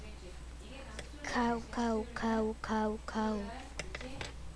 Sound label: cow